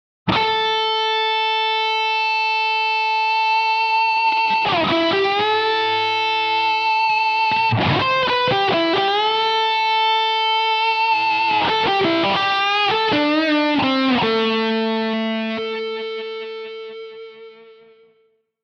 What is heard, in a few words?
Smooth Lead